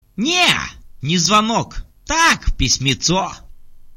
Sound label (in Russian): sms ne zvonok